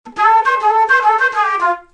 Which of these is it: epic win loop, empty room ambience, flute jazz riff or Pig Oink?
flute jazz riff